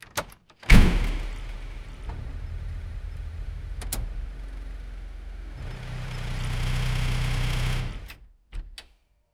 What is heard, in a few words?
door thud key turn; start